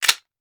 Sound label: mech, weapons; CopsNRobbers